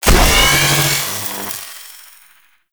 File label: Sniper Charge Up, Weapons; Warframe; Sentient; Caliban Prime Sniper